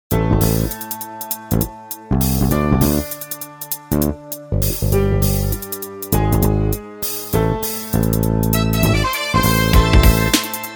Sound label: Jazz cafe